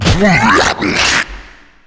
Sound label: spit blob barf; pestilence